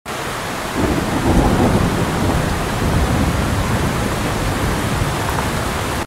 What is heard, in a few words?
thunder sounds